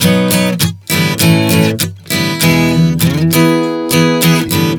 Progression 100 B-A-E, Acoustic Guitar, Chord Progressions 100 bpm